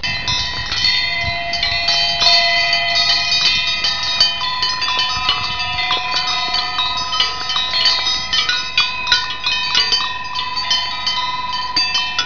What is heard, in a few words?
cow bells; germany